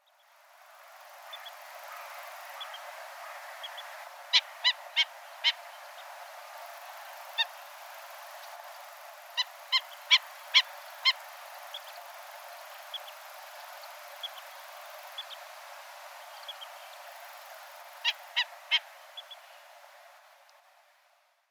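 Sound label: Black-winged Stilt, hyogo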